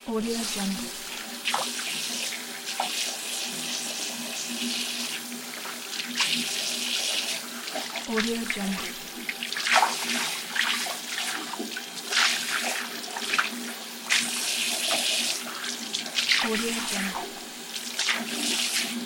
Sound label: hand washing